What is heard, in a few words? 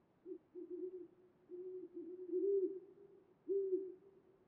owl